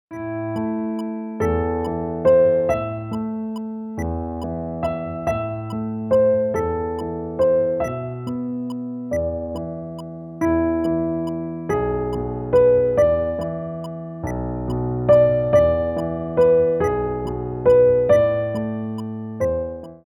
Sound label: PIANO La valse